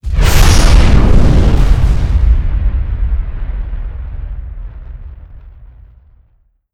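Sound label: explosion helicopter crash layer; Explosions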